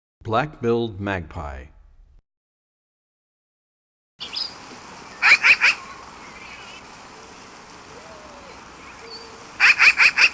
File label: Black Billed Magpie, Bird Songs; 101 Bird Songs